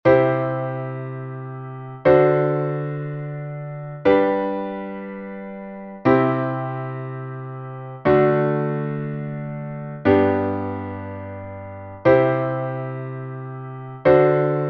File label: modulation